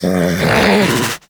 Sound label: Snow Critter Vocal Alerted; Hunting Critters